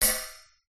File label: impacts metal cage small hit with metal object